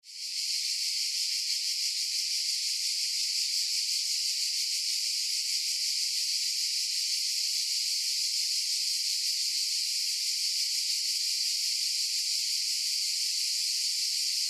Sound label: cicadas noise